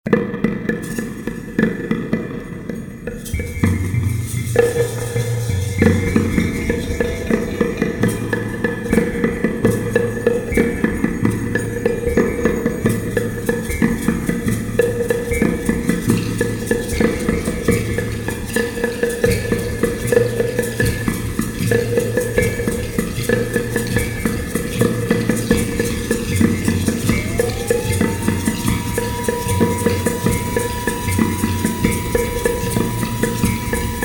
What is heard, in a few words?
Rhythmic Aliens; Up To Armageddon - Lite